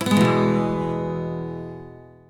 Stake Fanfare; MexicanaChillitime; ClubFusion

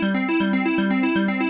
Music Box Reel Spin; JackpotDarts; ClubFusion

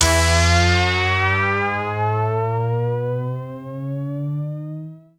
Bonus Wheel Symbol Anticipation; ClubFusion; Showtime Classic

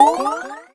question Pass; World Masters Pro; Dart Sounds

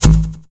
Change Cards; Twingo; ClubFusion

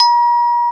Correct; PubQuizKnockout

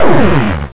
Wrong Answer; MexicanaChillitime; ClubFusion

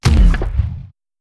Cannon Fire; LuckyPunch; G-Star

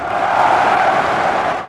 Correct; ItsComingHome

